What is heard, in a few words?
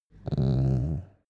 dog small growl very soft short